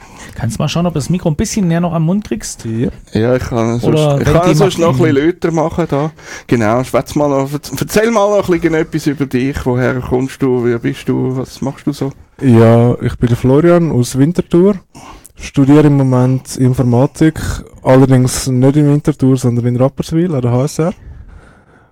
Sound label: clipping hackerfunk